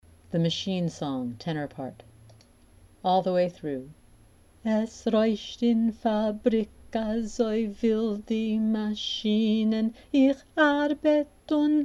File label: tenor